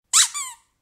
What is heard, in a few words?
Hit Sound sfx; Die of Death; MrScrewy